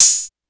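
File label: open hi-hat; Roland CR-80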